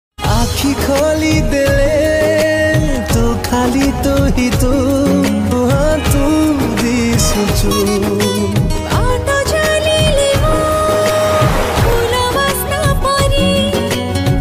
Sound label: Odia Romantic